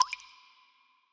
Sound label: Drip Hit